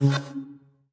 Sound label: inv disappear, player, inventory